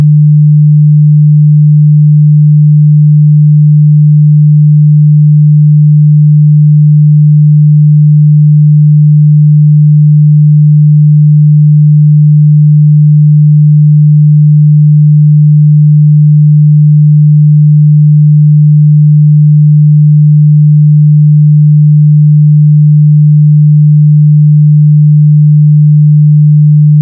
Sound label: Sine with Triangle Low Drone